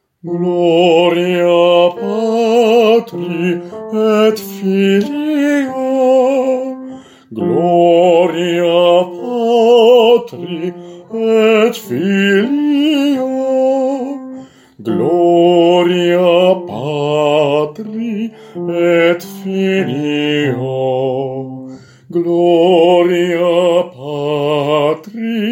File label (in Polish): BAS; Gloria Patri